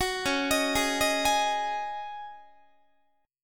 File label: Db Suspended 2nd Suspended 4th Db Guitar; arpeggiated; JGuitar